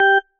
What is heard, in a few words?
marimba tissue; ゆめ2っき